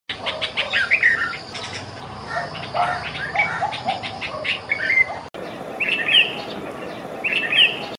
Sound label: bird audio